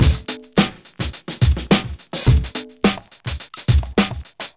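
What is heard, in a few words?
106 bpm, Funky loops; Zero-G; Funky Elements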